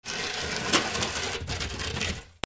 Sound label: FRICTION